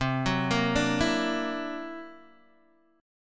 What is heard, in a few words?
C 9th Flat 5th, Guitar DADGAD, chord